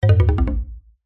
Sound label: cartoon descend fall mallet